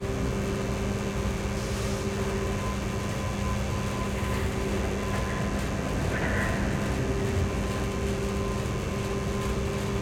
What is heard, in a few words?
INT Haunted Factory, Pipes, Tools, Broken Fan, Electricity, Lights, Hum, Reverberant; 344 Audio; Haunting Ambiences